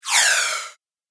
Mage Down The Portal, magic sound effect; Andrew Fleming

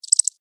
Cricket Chirp, Single, Insect; Animal; Bug; Crickets

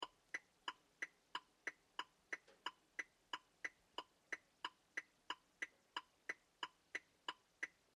GMC Savana, Turn Signal, Tic Toc, Truck; 2010 GMC Savana; Trucks; Vehicles; Big Room Sound

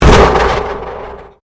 Convolution Pulse

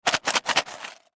Board Game Box Shake Plastic Pieces Cardboard; Sports

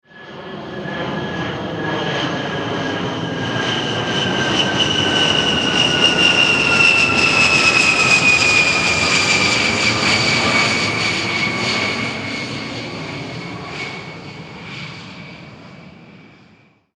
Vehicle Jet A10 Pass By Military; Airplanes; Vehicles

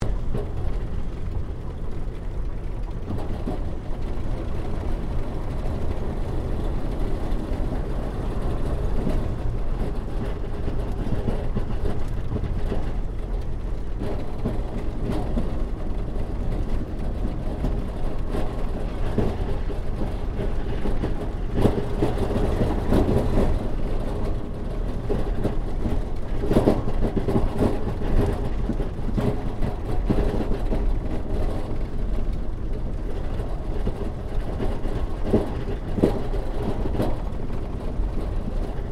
AIRCRAFT RYAN PT22 TAXI INTERIOR; 1942; Airplane Sound Effects; Airplanes (Sound Effects:Vehicles)

